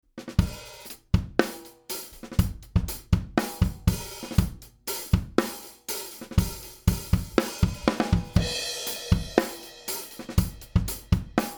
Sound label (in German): AMS Neve 33609N Drum Bus 6 zu 1 Extreme Kompression mit Limiter max. Reduktion 9 dB